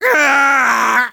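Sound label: pain critical death, de; Sniper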